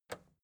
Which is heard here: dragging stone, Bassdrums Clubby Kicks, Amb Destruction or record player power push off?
record player power push off